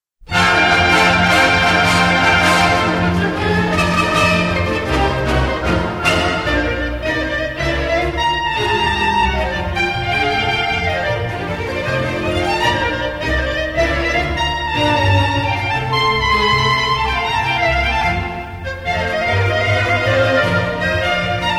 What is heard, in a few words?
clarinet candy